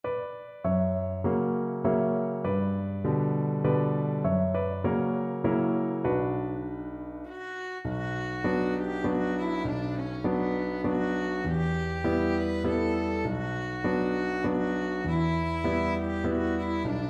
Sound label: yigdal violin